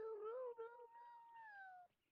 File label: awoowoowoo